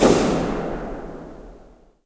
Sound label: Servo Large Stop; Puddle; puddle plus ios gamerip 2018